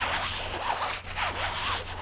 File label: rope rappel loop